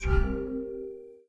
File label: menu back; Source Engine; Portal 2